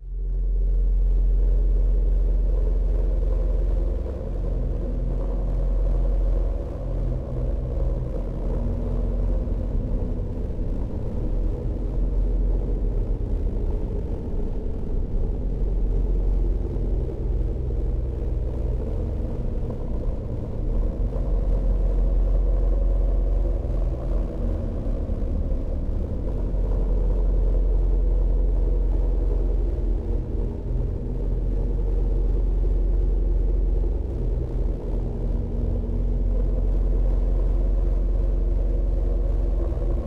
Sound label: ambience, dark ambient